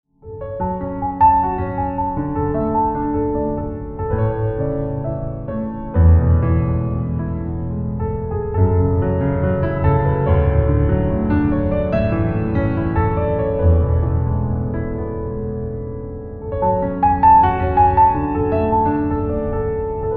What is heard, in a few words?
Piano